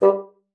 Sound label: bassoon, G3; Xsample VOL-6